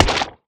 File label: axe mining; Factorio